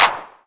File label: Claps & Snares